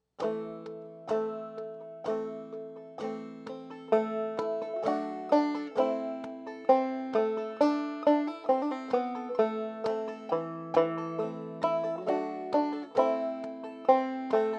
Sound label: sing slow, banjo; Christmas